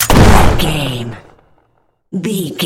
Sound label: gun shotgun shot